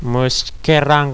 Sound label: musikera